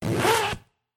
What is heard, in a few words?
Zipper